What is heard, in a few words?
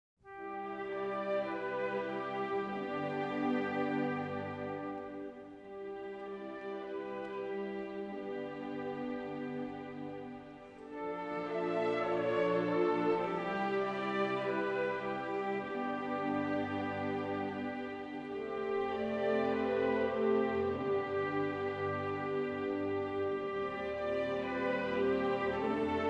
instrumental